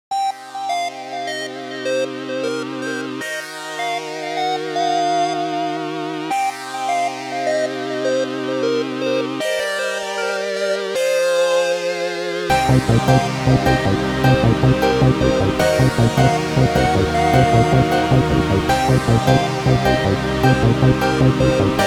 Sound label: inst